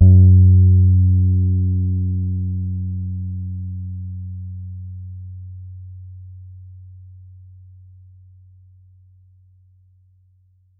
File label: Es F#3 soft, Bass, Pedulla Thunderbass; Giga Samples Collection